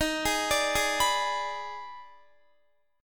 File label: Eb Major 7th Suspended 4th Sharp 5th Eb, Guitar; arpeggiated; x,18,20,19,20,19; EbM7sus4#5